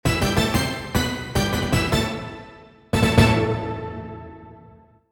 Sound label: hit; cms weihnachtskalender 2023 freeware